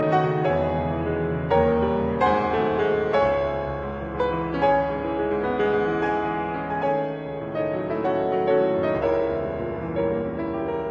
Piano Sonata in C sharp minor Allegro ma non troppo; Bortkiewicz; Piano Sonata No 2; Op 60